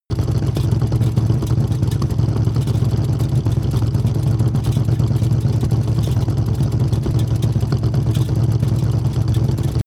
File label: van engine damaged idle; verkehr transport; autos